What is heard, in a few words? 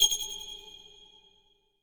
new mission; geoscape